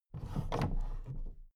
big plastic case raise

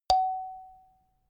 notification kalimba single high

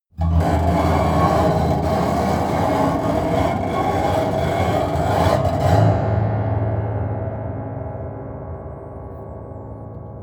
piano bow string broken low slow

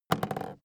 aluminium case handle bounce light

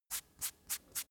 brush sequence short

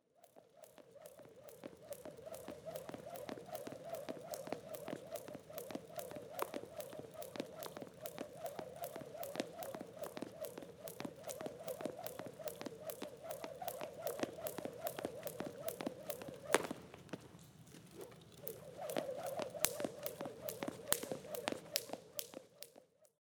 boxer with jump rope

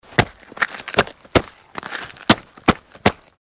steps on ice

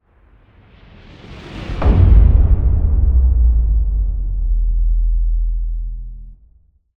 whoosh to sub

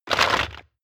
crab movement cracking

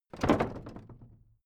old wood case drop

